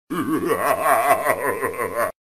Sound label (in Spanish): efecto de sonido risa malvada